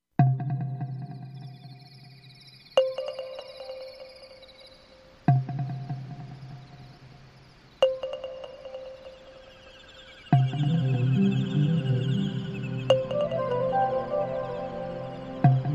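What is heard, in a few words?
Pastoral With Crickets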